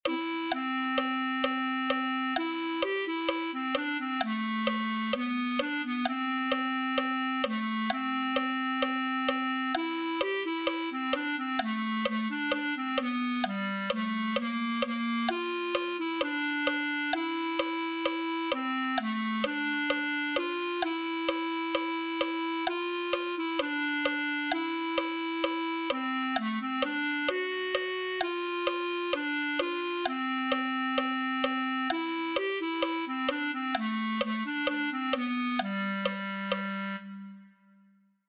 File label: clarinet